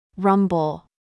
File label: rumble